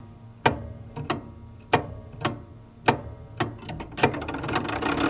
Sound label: Grandfather Clock Ticks